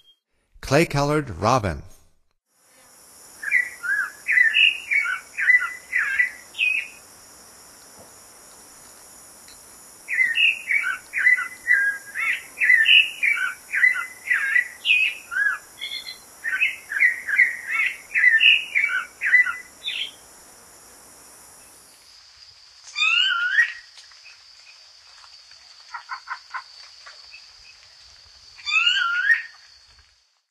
Clay-colored Robin, Songs of Birds of North America; Stokes